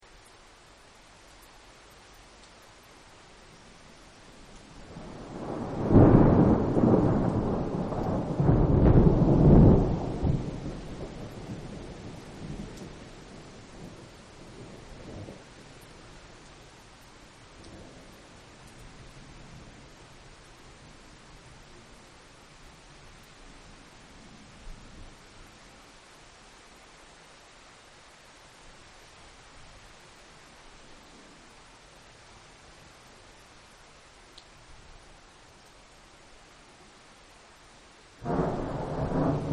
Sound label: Rain and Thunder; Rain Against Window; Rain Sound Effects; Weather